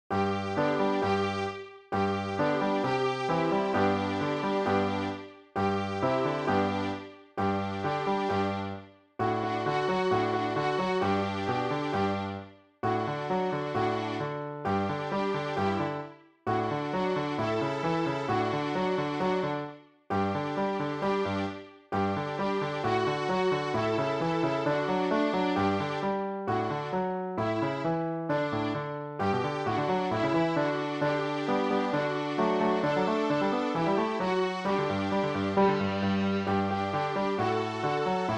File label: D String